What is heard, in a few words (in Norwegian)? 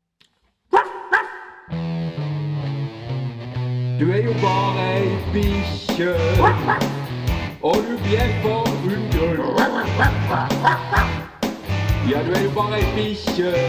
hund